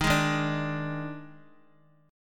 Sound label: Eb Major 7th Suspended 4th Eb, Bass Standard; strummed; EbM7sus4